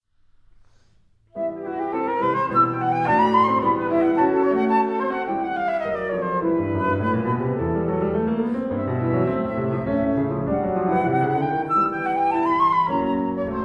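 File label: flute piano sonata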